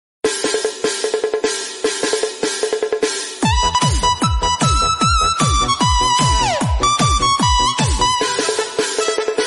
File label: funny music